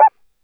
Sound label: Bell, Yamaha DD-20